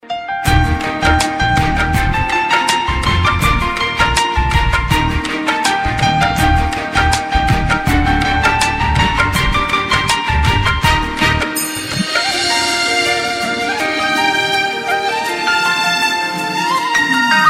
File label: Keyboard